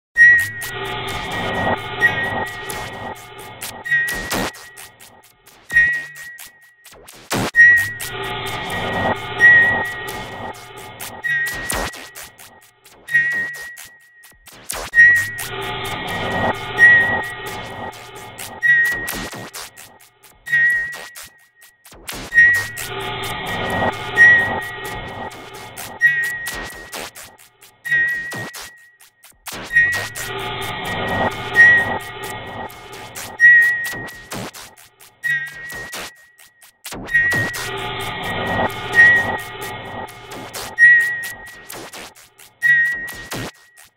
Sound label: Beeps and Echos Sound Effect